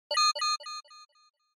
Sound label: Short Accent Delay